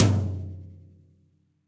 Tom Floor High Bright Room; Drums; Drum Hits; Ableton; Factory Packs; Session Drums Studio; MPE Hits

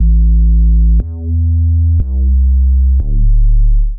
808 Boom Kit; Drums; Ableton Factory Packs; Beat Tools

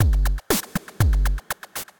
Kit, Effected Drum Kits; Drum Machines; Ableton; Factory Packs

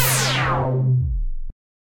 Sizzle Down, Wavetable, Effects; Instruments; Ableton; Synth Essentials

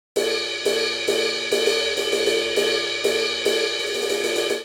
Linear Funk Hihat Only 100 bpm; 4 4; MIDI Clips; Ableton; Factory Packs; Drum Booth

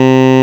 vvox, Digital Synths; Ableton Foundation FreePacks

